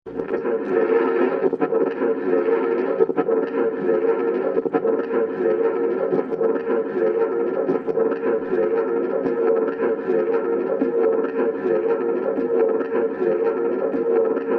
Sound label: noise loop